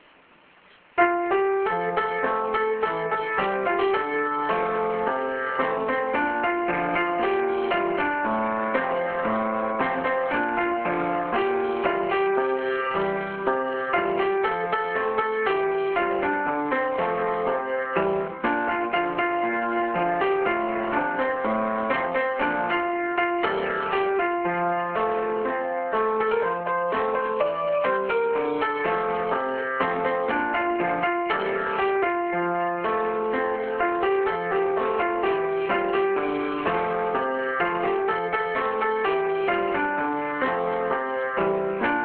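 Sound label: song accompaniment; Moving battery song; moving dog battrey song teach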